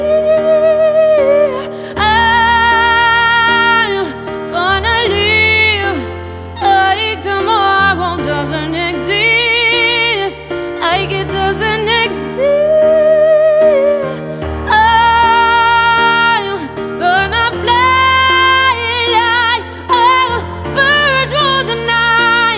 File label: Piano Version